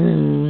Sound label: Lo Buzz